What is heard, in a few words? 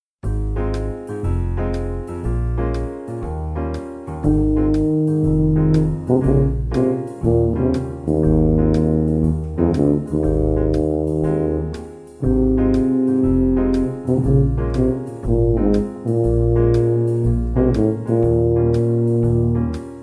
Smooth Groove Tuba